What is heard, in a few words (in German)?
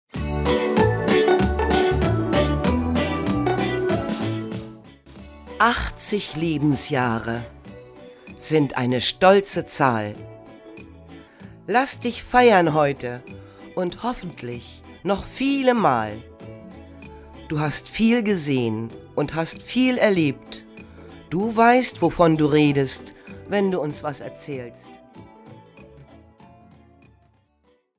Geburtstagslied; Gratulation